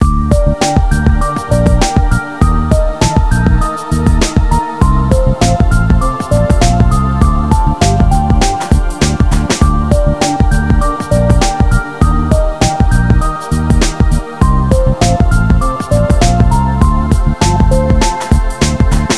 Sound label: song; svencoop; sc disco